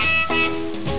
blues juke

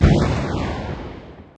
WARPED, EXPLOSIO; PaxImp

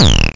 base drum; 303emu

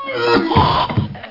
Exclamation; Witchaven

